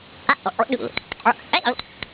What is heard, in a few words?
click